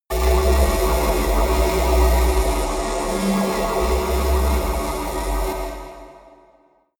space alien energy plant high; comedy-cartoon; elektronisch

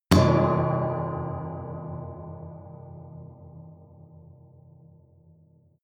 underwater metallic

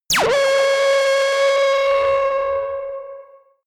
laser blasted; krieg-waffen; soundpool

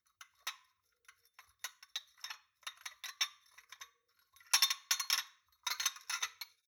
shot glasses handle long; berufe; gastronomie; soundpool

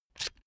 electric drill template adjust heavy out; maschinen; bohrer; soundpool

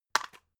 drug package drop soft; arzt

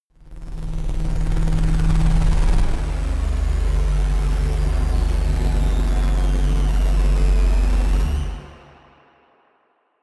bass double swell, Digital; atmosphaeren umwelt

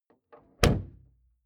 car door close exterior hummer h3; Cars

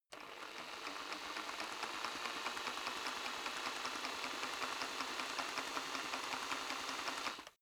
rock drill hammer speed up very soft; maschinen; bohrer; soundpool

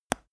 teeth clench; koerpergeraeusche; menschen; soundpool

